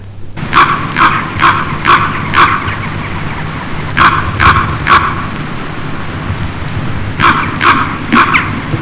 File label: Condor ambience sound